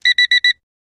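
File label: Clock Alarm Electric Be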